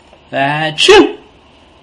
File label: ahhh chuu